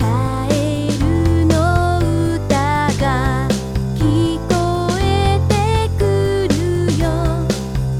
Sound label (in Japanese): Vocal MIX あり; synthv pro2 vocal mix